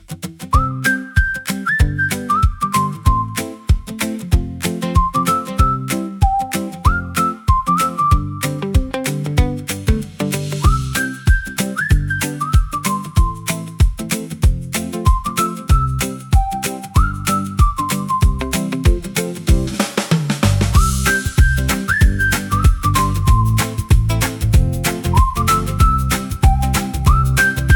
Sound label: Sunrise Spark Run, hand wraps whistles